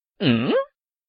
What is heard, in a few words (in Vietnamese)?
Nhac chuong tin nhan u hu